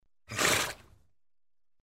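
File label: one short snort; Фырканье лошади; короткий звук; Лошадь фырк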